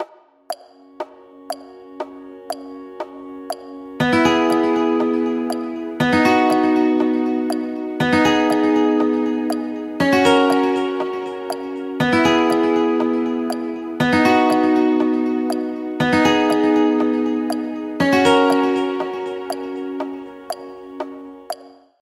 Click Clock Remix

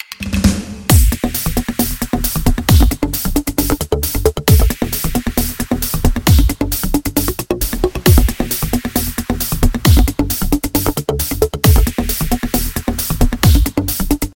Deep Jungle Trip Hop

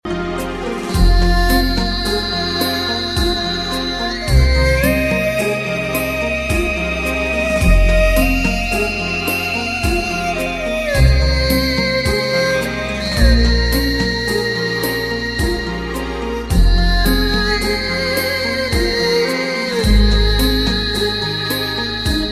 Mongolian Melody

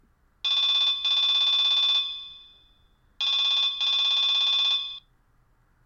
Fire Alarm

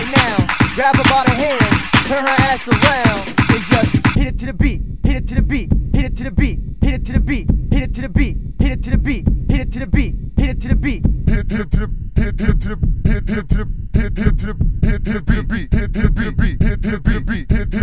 Beat

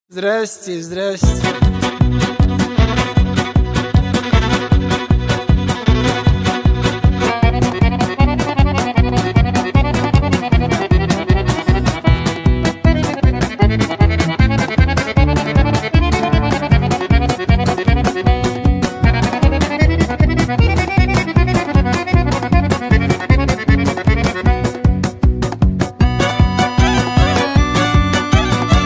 Gypsy Music

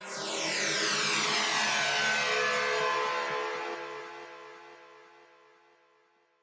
Plasma Burst

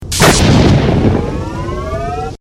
gauss rifle; Stalker

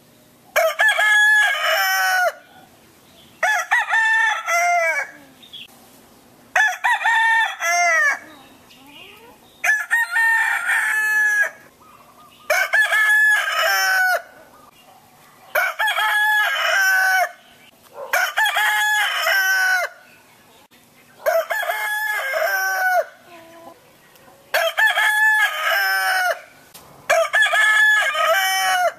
Rooster crow

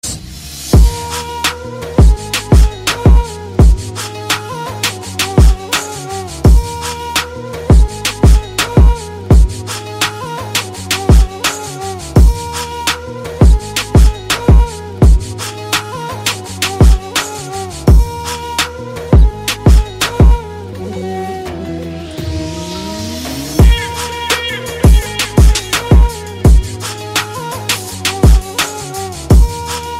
Turkish Saz

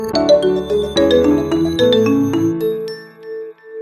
Nokia New Lumia 920

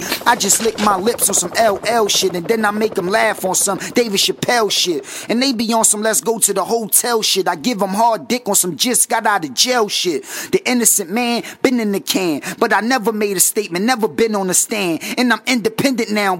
Acapell

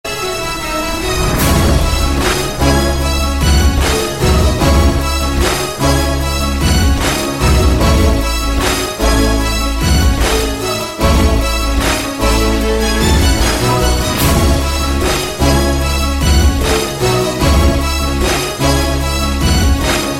Violins And Rock

